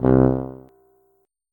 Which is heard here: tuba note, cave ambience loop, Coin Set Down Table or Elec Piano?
tuba note